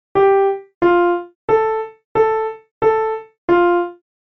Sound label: Melody